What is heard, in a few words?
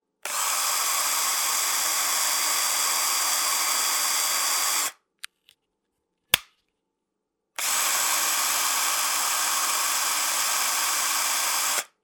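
sound of screwdriver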